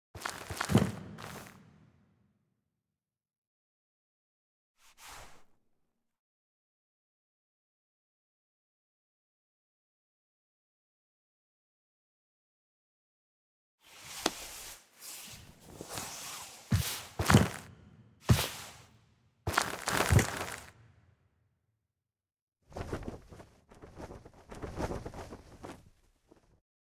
Finale Foley; Cinematics

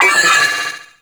Vocal Pain; Warframe; Sentients; Sentient Trooper

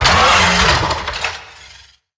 Necramech Melee Pain; Battle Barks

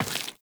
Step Flesh, Footsteps, Walk; Warframe; Tenno

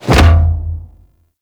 Breakout Hit Two; Amalgam Carrus Pilot

